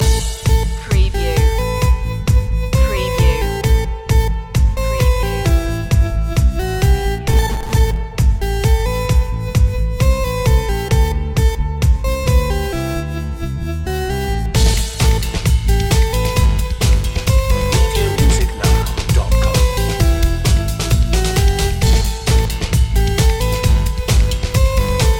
retro video game

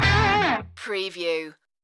club blues loop end

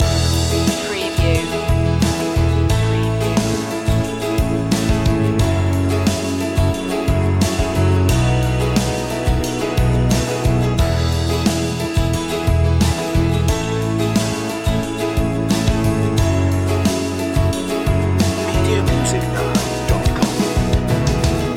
acoustic driving day loop

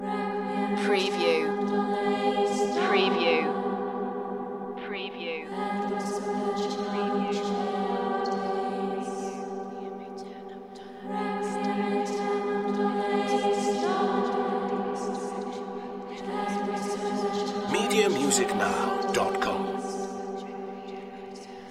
celtic requiem loop

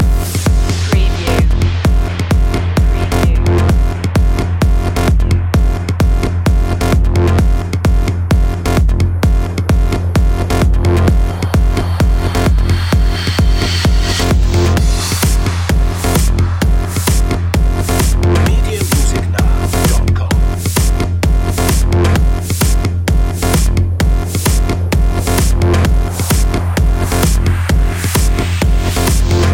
dutch influence loop

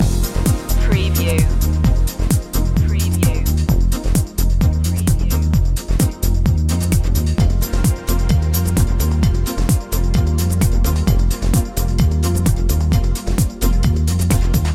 future run loop

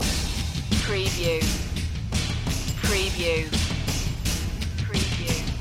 percussion loop

